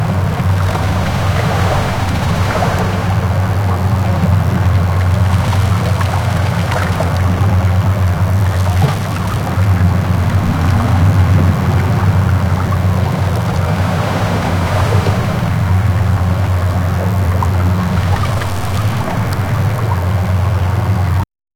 realistic high, mediterranean

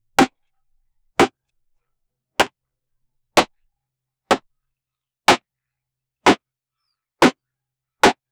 man cutting the tree with, chopping

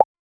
subtle menu button hover

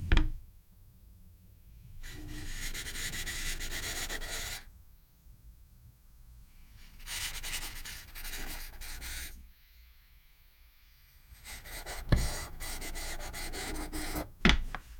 a chalk slides on board; education

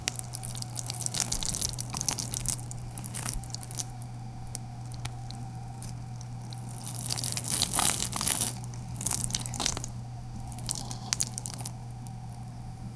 ultra realistic, anaconda

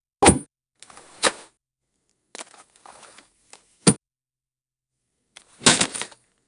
dive into portal in smart, portal

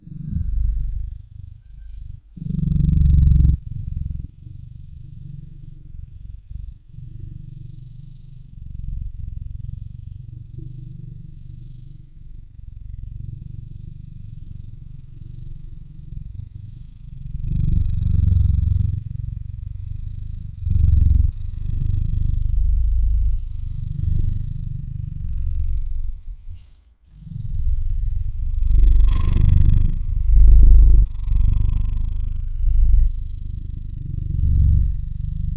panther roar purr and gro

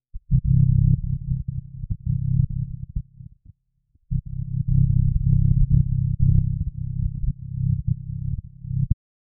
enhancers pulsing heartbe, synth

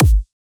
dubstep beat transformer

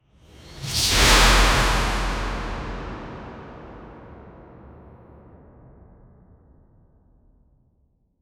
shout surprise

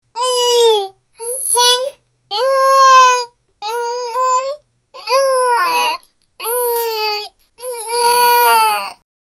pot kicking sound clang, metallic hit

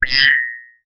short metallic spring boi

